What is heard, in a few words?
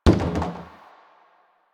Plastic Hit Short; Warframe; Ambience; Nineteen Ninety Nine